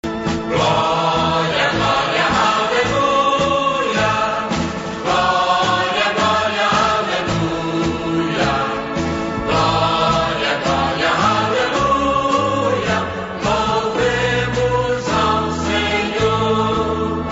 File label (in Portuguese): gloria gloria aleluia louvemos ao senhor